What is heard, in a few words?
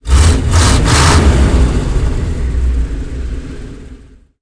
m5a engine load, tanks; War Thunder